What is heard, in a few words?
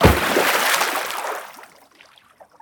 big Splash